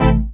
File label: SYN Syn; Best Service Gigapack 1